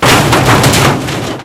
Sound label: break metal